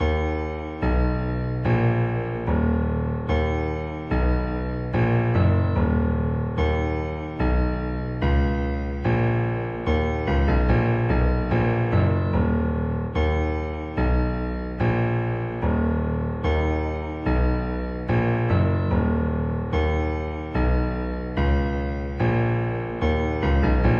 bgm Battle

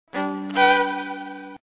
Success

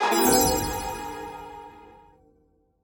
Complete

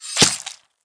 Attack

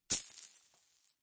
Coin

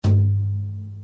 Echo Card

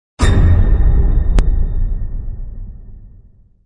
Defeated

